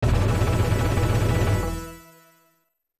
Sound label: Unknown Jingle; Mortal Kombat II